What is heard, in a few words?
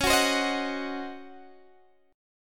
Db Major 7th Suspended 2nd Suspended 4th Db down Guitar Open D